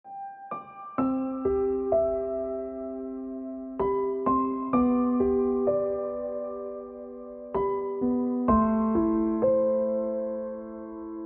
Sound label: Ringtone Piano Music